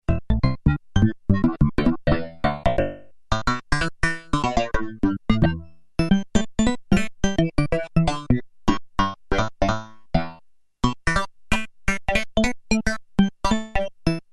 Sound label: funky wavetable